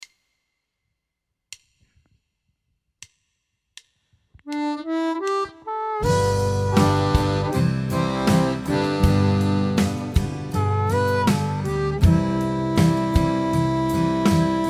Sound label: harp 80